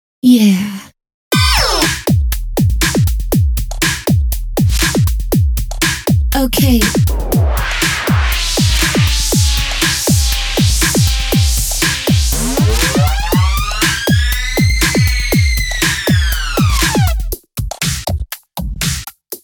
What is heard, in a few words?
Pop Fused With Noise